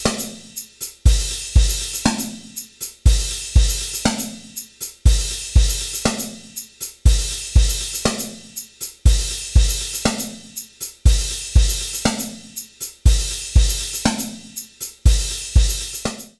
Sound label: Korg KROSS 2 Drum Seq Flanging